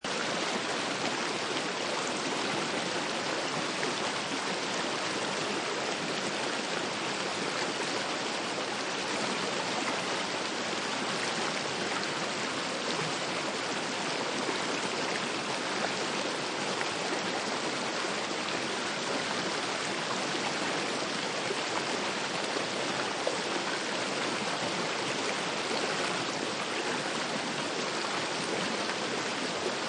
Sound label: Smooth River Loop, A very smooth water stream flows gently down river; Water trickles evenly downstream. Seamless loop.